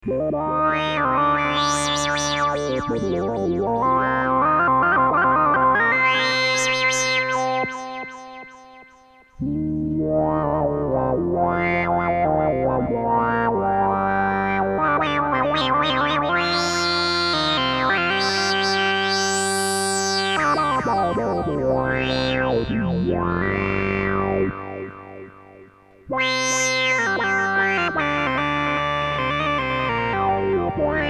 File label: SH 1000 Solo Delay